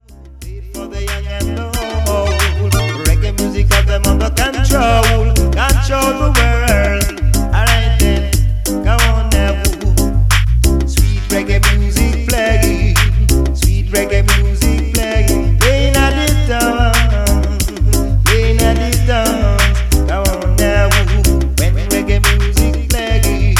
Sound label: Sweet Reggae Music